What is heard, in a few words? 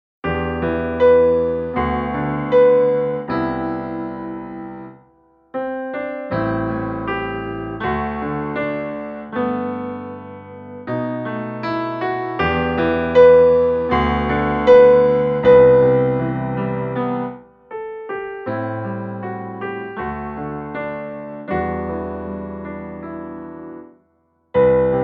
sadness